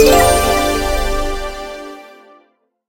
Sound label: count Blink; dazzle